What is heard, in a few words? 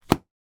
game money notes wad put down on playing board; monopoly